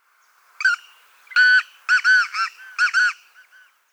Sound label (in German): Halsbandfrankolin Francolinus francolinus; Vogelstimmen